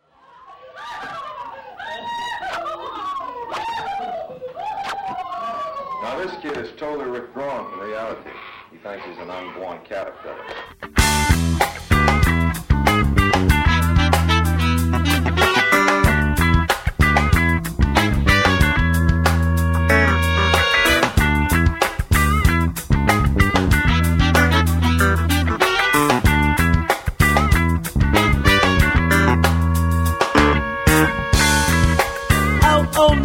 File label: ahh